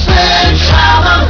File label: sledgehammer